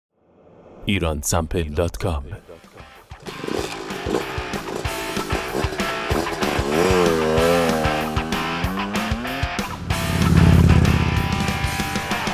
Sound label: motorcycles